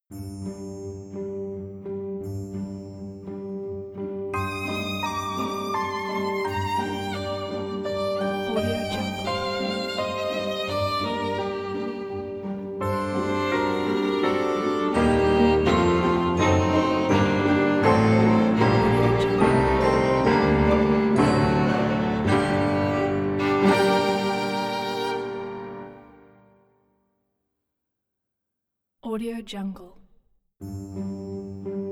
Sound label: classical intro 2 violin and orchestra